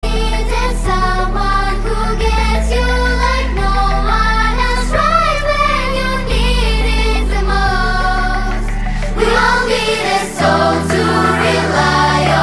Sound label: Choir Ringtone; COLOR MUSIC